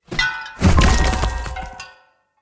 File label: Ground Slam; Enemy; Corrupted Sproutfolk; Combat